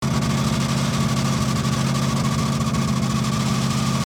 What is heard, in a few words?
CarEngineSqueak